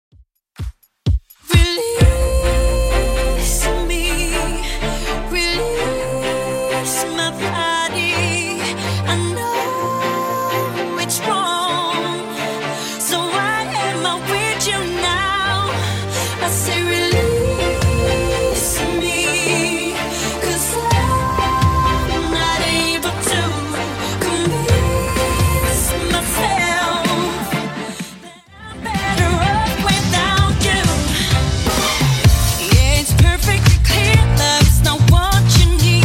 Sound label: 2ks Redrum 127bpm